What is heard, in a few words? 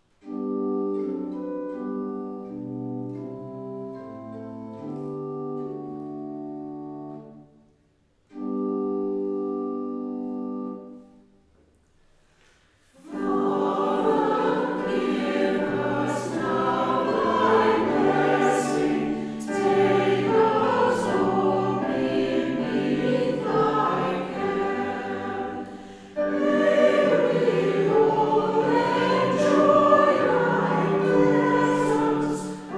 Choir, hymns